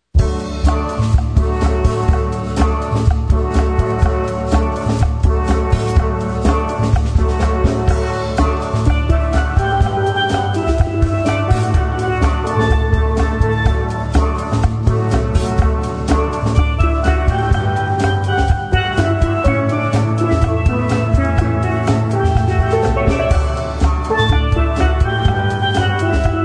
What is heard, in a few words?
steel pan sax